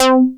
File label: BASS C4; Best Service Dream Experience; R100M S+H